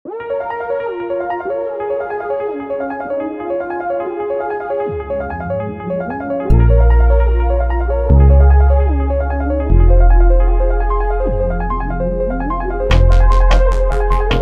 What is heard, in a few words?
rustle of spring; Sinding’s Rustle of spring